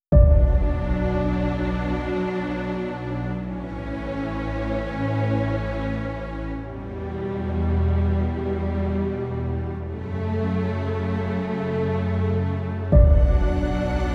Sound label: dram loop; by burak sound bank; dramatic dram vol 10